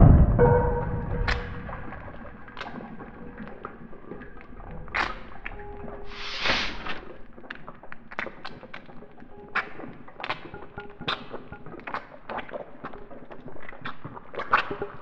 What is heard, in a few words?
FRIZZLE, ATMOS; AKAI Anime Sound FX